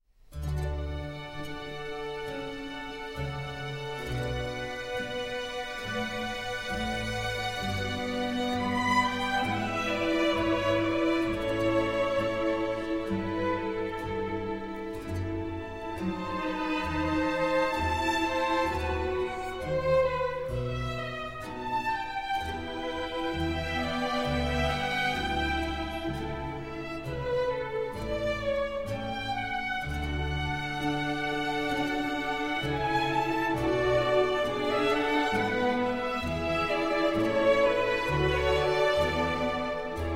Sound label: Orchestral Suite No. 3 in D Major; J.S. Bach